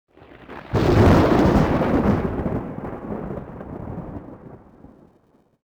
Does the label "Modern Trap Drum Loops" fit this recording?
No